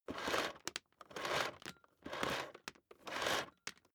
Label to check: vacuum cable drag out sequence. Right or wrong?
Right